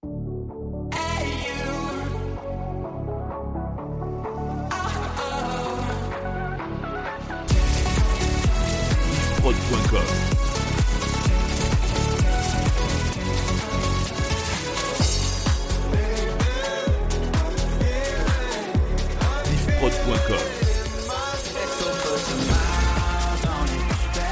Medley Hits 128 BPM

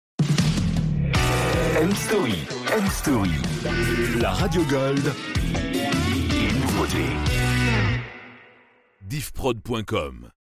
Sweep Gold et Nouveautes Funk

